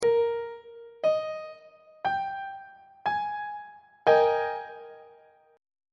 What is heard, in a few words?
a flat major 7th suspended 2nd 1st inversion on treble clef